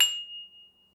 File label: ding